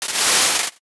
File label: paper ripple transition